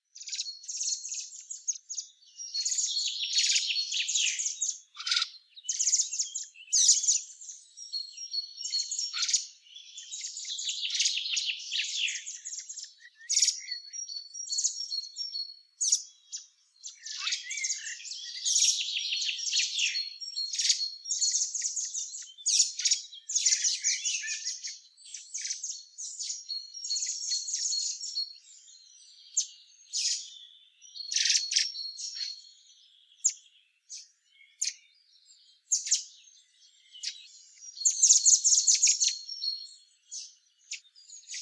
Turdus merula; SETE

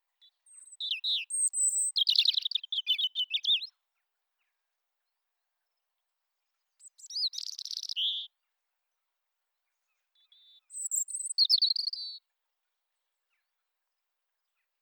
Erithacus rubecula; SETE

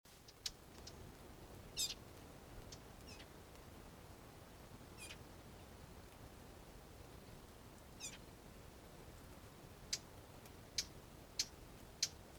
Sylvia atricapilla; SETE

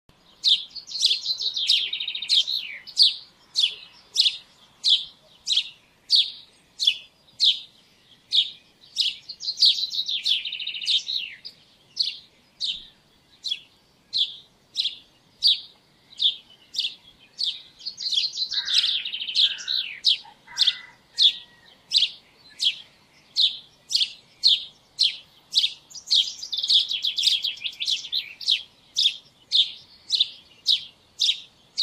Passer domesticus; SETE

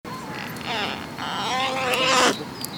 Corvus corone; SETE

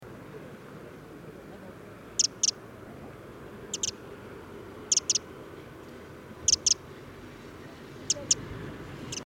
Troglodytes troglodytes; SETE

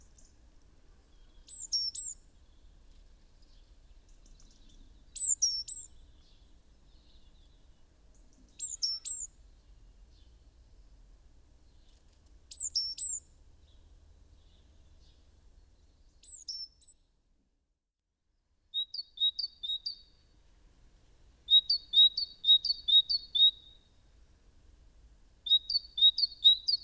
Parus major; SETE

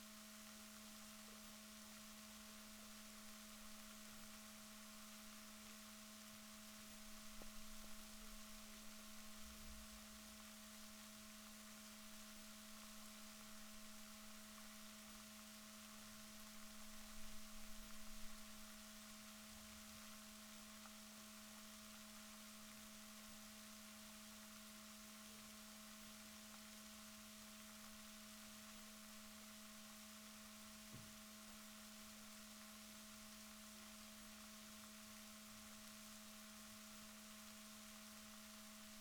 rorquals positif, Balaenoptera Physalus Chile

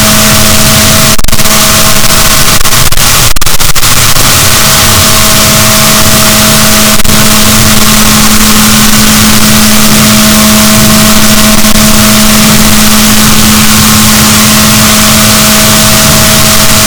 rorquals positif, boat, BalaenopteraPhysalus; Balaenoptera Physalus Chile

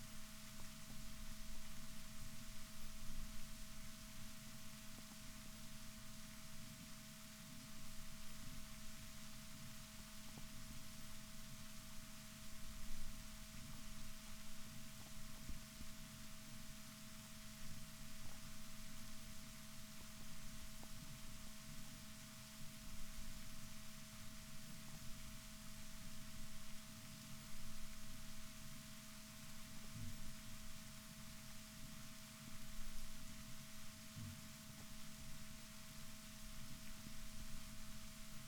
rorquals negatif, Balaenoptera Physalus Chile

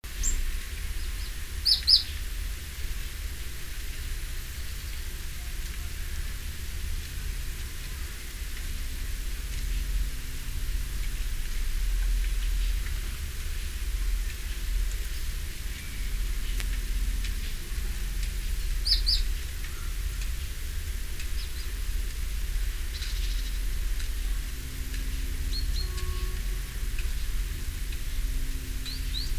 Parus major; SETE

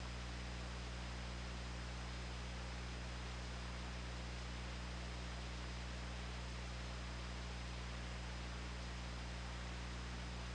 OrcaLab

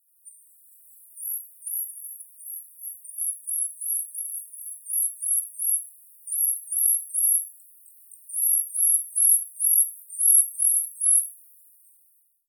Cyanistes caeruleus; SETE

